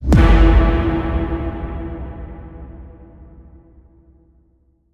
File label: Impact E; 99Sounds x Monosounds